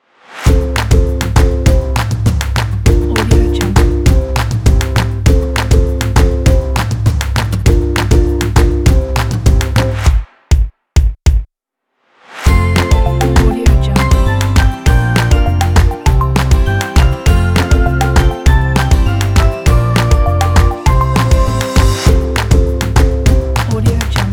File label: happy on kit